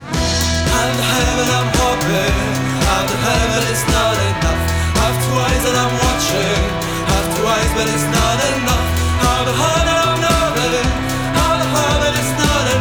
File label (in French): Frequentiel Basses déficientes